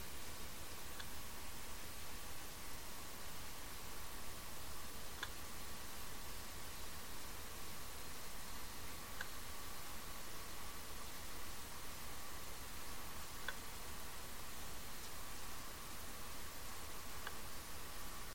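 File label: sperm 5 clicks, whale; Yukusam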